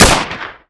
Mini Uzi Shoot; Modern Weapons Pack

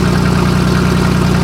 prop Start; Parts Pack

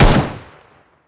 M9 Shoot; Modern Weapons Pack

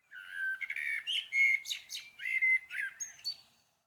chicka, wildlife

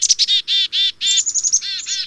chicka, wildlife

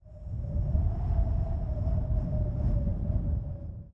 wind moan

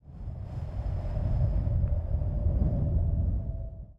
wind moan

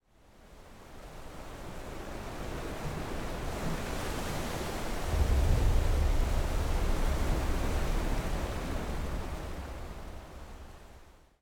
wind hit

